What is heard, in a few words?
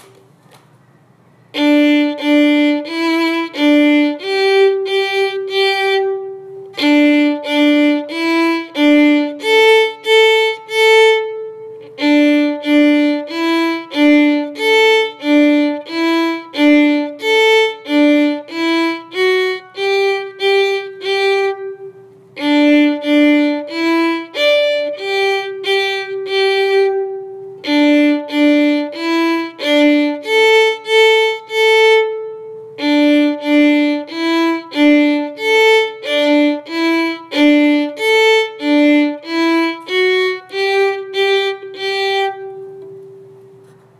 Two Step March